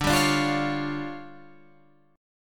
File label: D Minor Major 7th Double Flat 5th, D